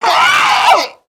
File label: pain, zomb female; nmr